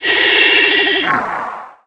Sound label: facehugger threat